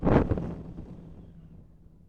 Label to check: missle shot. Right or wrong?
Right